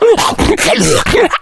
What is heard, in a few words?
rage, infected, male